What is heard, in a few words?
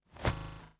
Glitch, noise